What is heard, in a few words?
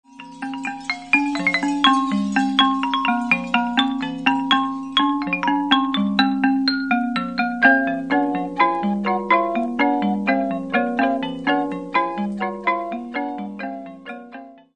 marimba